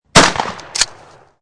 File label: 12 Gauge Bridge; 870; War; VRSonic